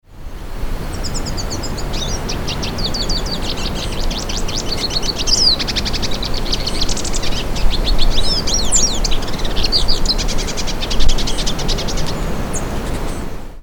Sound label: Carduelis magellanica; St Ant Pinhal; SP; Brazil